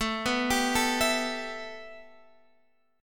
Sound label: A 7th Suspended 2nd A, Guitar Half Step Down